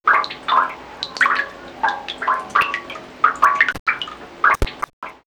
Nature After Rain; X-Static Goldmine

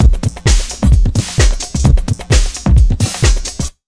JUMP 130, Big Beat Drumloops

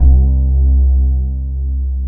BASS 170 BPM, Drum & Bass; Ueberschall; Drum & Bass Flash Trackz

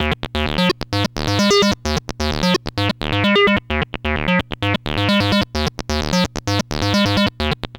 SYNTH SEQ; AMG; Global Trance Mission VOL-2

